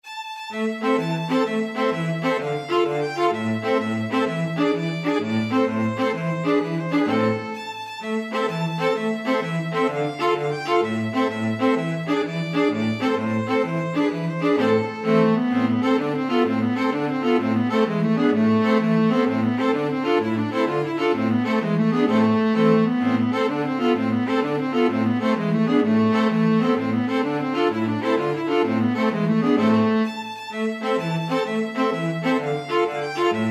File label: tarantella, string quartet